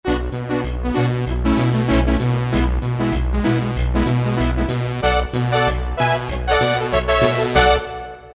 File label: Slappin Brass; Roland PN-D50-01